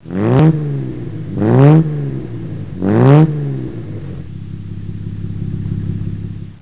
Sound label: jag mk2 3.8